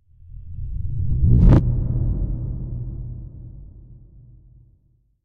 Impact Deep Reversed Air Hit; Hollywood Edge; sound designer's toolkit v2

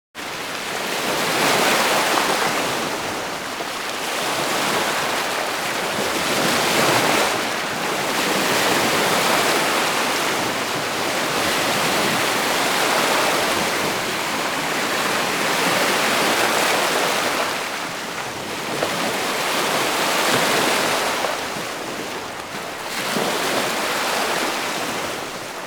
water lake; best service Blue Box; water streams

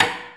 knock echo long, Cinematic Metal Impacts

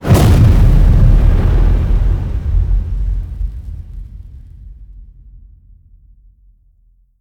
HIT Fire, Cinematic Hits Designed

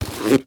Latex Gloves Box; Blastwave

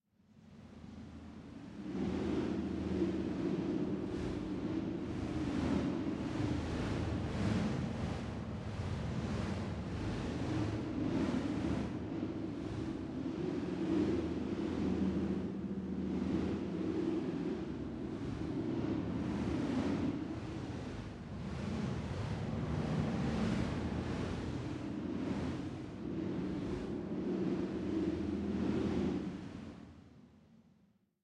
Wind Medium Ambience, Light Whizzing; Hollywood Edge; sound designer's toolkit v2

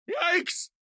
town human road; Blind Drive

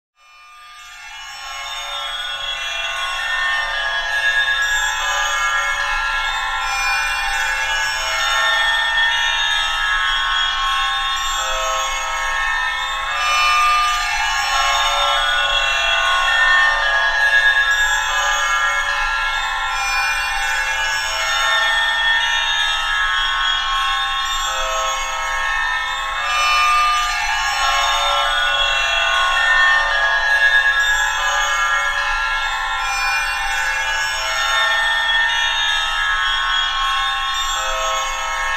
Magic Bells; Sound Ideas; Series 2000

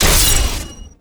Pickup Sword Powerup

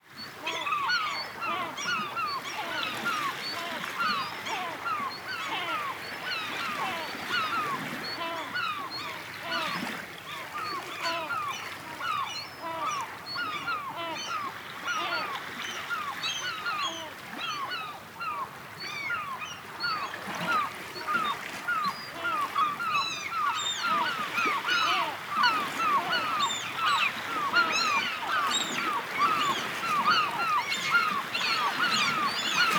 sea small waves birds; best service Blue Box; sea ocean

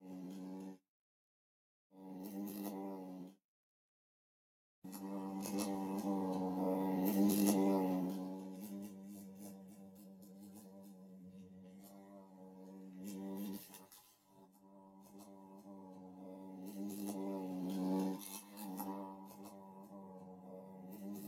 fly in car; Blind Drive

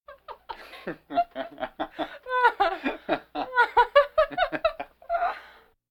Vocal effects breathing Moaning Baby

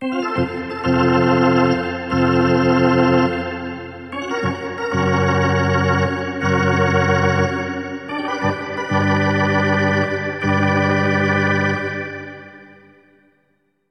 Hockey Organ

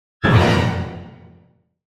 Symphonic Hit Surprise Chord - Lower; Hollywood Edge; sound designer's toolkit v2

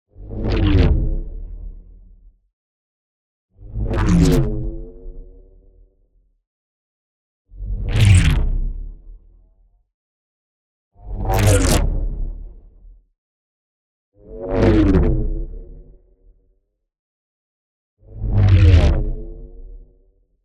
NEON WHOOSH LARGE FAST Beam Sabre Movement; boom library

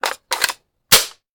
Machine Gun Foley; Sound Ideas; Guns

